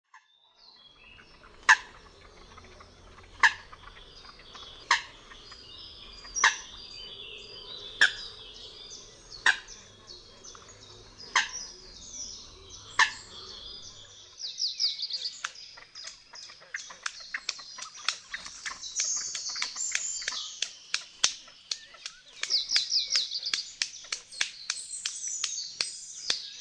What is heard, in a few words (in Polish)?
lyska, birdsounds